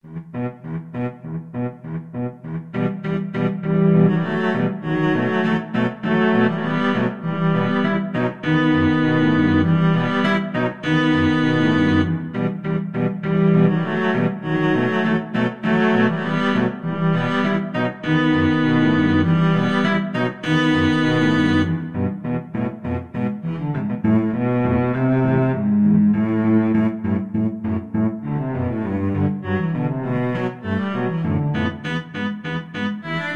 Danse des cygnets, cello trio

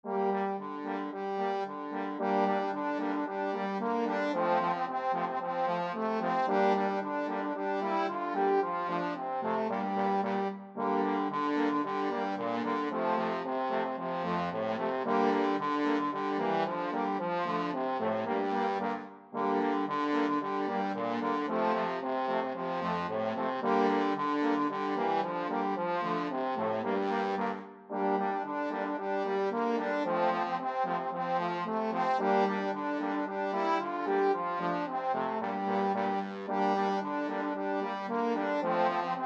drunken sailor, trombone quartet

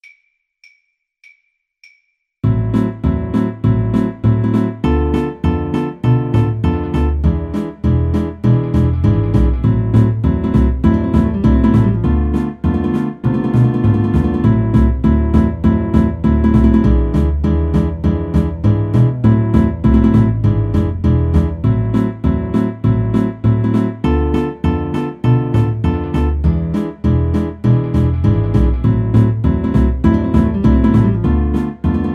Piano

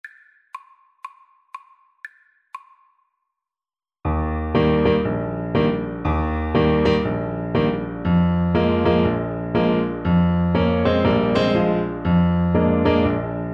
take this hammer, Trumpet

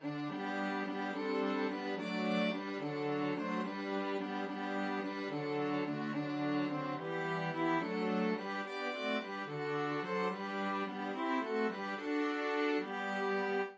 three ships string quartet

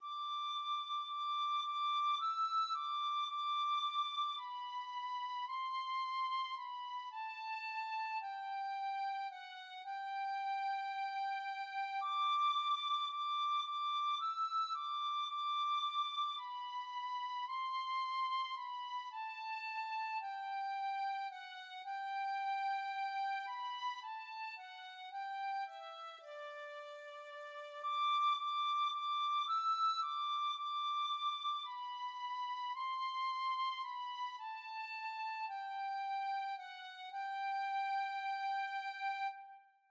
tin whistle

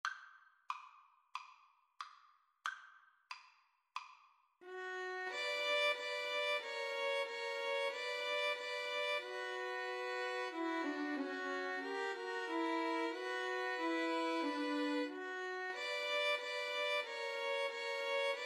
Violin Trio

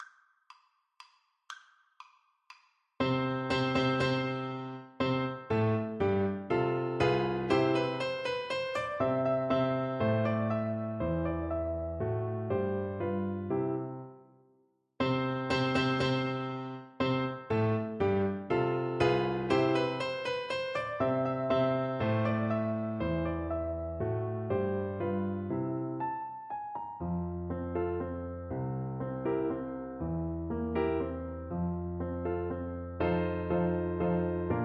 la celestina FL kar, Flute

